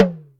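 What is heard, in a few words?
Talking Drum, Yamaha DTXpress Percussion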